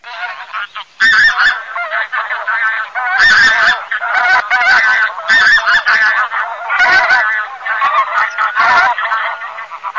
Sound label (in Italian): OCA LOMBARDELLE